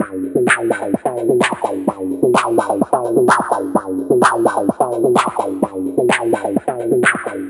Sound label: VFX2 Loop 128 BPM